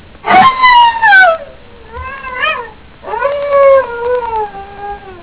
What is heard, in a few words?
howl